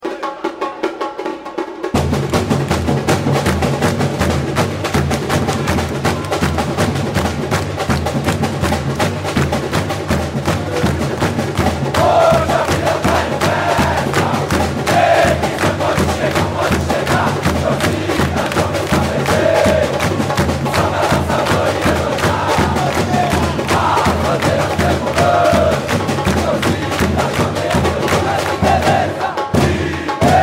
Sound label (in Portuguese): torcida; torcida jovem do santos